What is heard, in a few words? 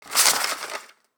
use map